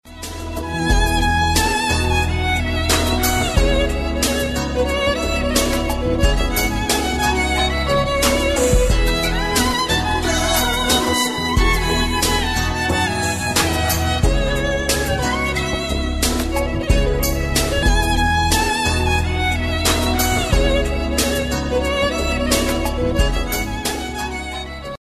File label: Violon; Ringtones